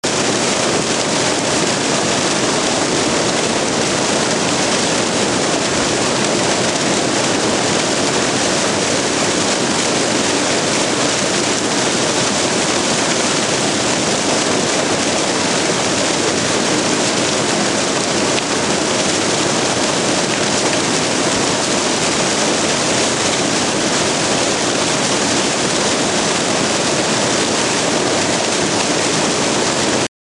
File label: water stream